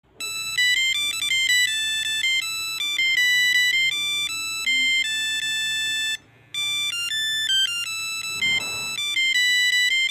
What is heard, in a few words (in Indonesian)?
nada dering Nokia jadul 3315 Tetris music